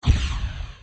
Magic

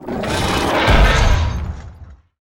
new wolf attack

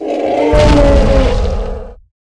wolf death

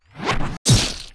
Rush

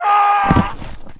Dark Death

